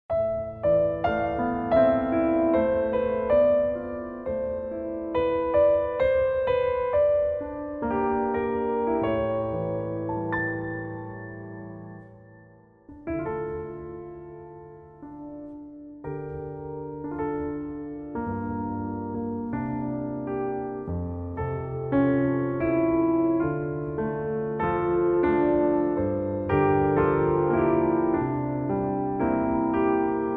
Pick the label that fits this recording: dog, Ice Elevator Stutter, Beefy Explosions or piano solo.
piano solo